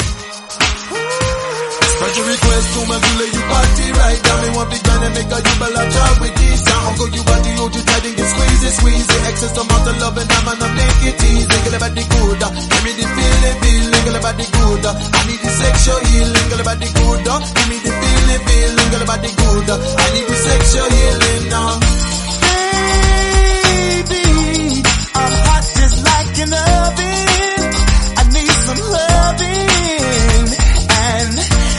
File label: REDRUM 99bpm Ebm